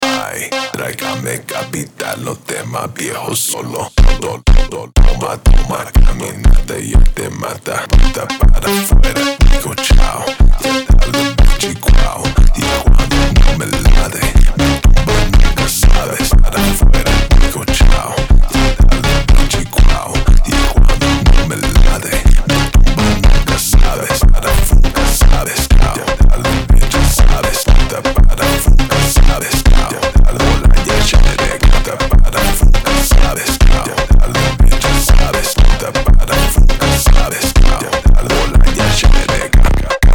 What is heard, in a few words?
Slowed